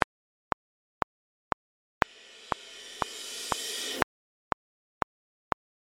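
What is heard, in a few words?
cymbal reverse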